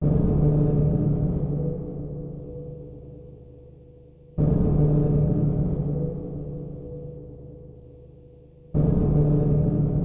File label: Fast Ambience; hitman 2 silent assassin